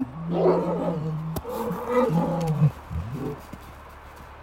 Lion talking; African Lion; GarLyn Zoo Wildlife Park

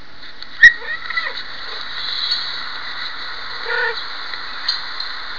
young cougar

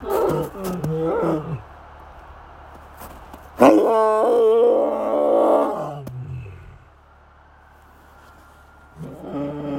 Lion talking; Guinea; Longer Lion Talking

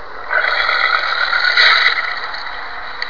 Camel

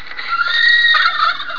sika bugle; Sika Buck bugles in rut; turkey set off by bugle

